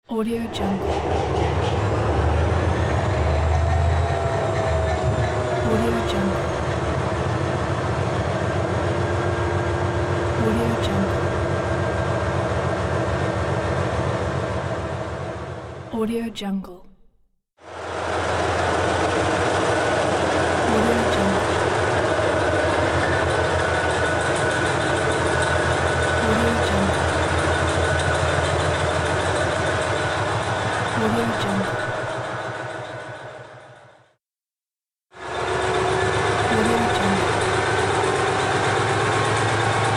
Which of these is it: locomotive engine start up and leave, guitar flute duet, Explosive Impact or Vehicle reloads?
locomotive engine start up and leave